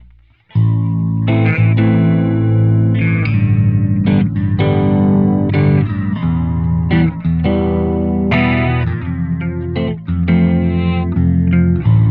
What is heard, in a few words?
Ballad